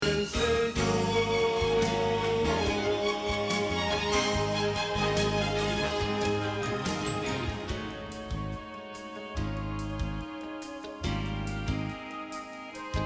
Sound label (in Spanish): Bajo